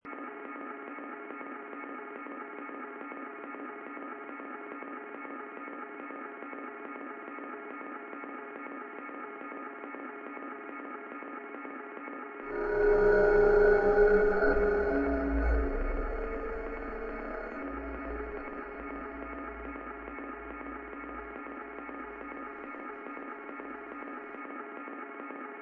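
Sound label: Sad Flute Single Note With Multi Tap Fast Loop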